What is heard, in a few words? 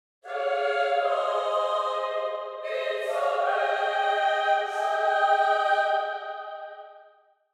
Angelic choir angels; divine intervention